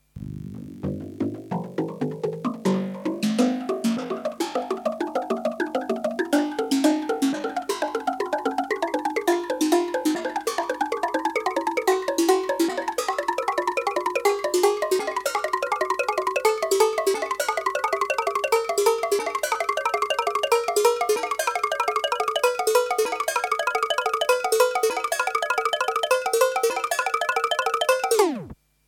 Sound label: 12 intervals; midibillow